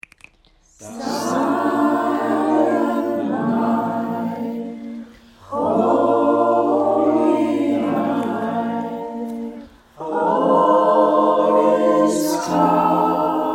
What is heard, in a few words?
Merry christmas Silent night